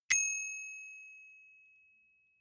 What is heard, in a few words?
bicycle street bell